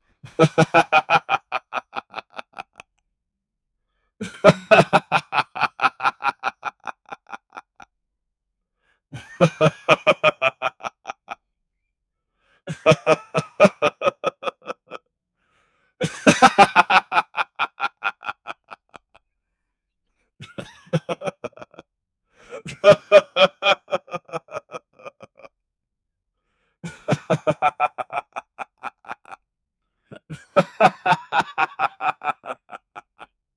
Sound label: a man laughing; laughter